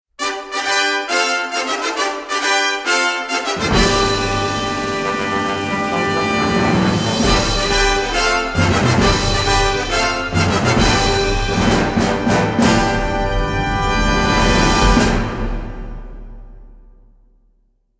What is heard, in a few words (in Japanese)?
予選ファンファーレ; チャンピオンズミーティング; umamusume pretty derby winning live 12 2023